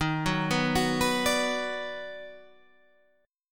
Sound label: D sharp Minor Major 7th Sharp 5th, Guitar Standard; arpeggiated